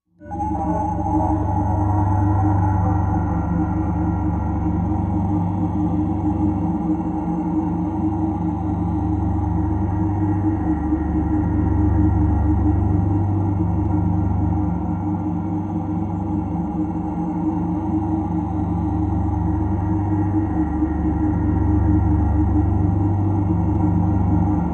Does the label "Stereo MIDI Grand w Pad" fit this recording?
No